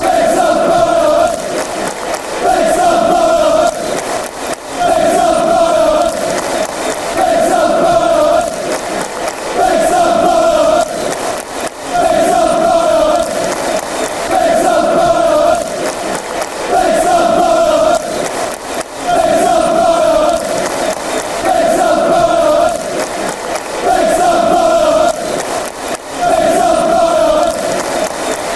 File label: fanchants; big sams barmy army